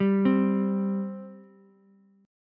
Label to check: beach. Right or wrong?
Wrong